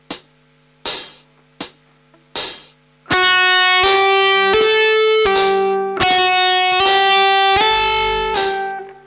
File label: leg